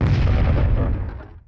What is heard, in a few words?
explosion